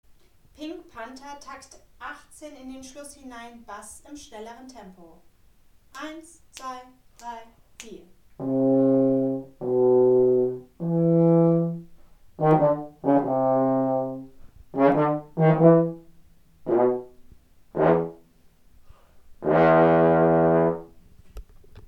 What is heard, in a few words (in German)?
Stimme 4 schnelleres Tempo, Posaunenchor; Takt 18 in den Schluss